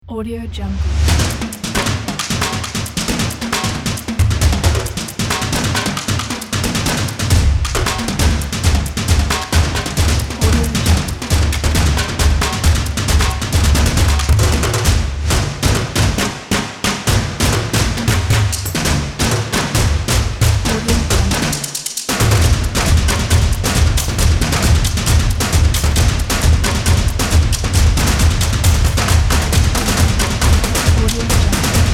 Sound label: massive tribal stomp